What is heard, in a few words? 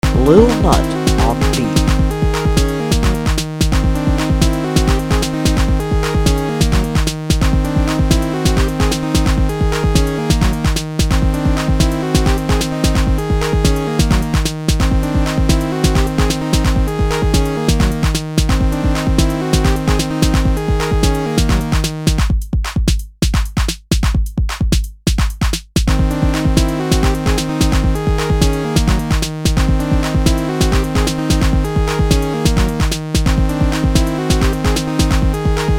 super beat